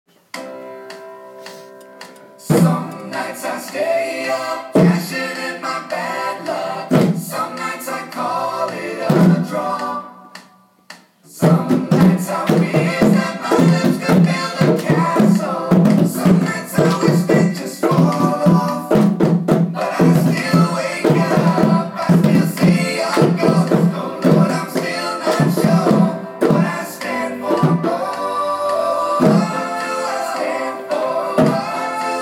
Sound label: rythms